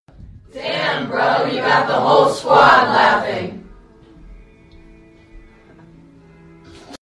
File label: laughing; damn bro you got the whole squad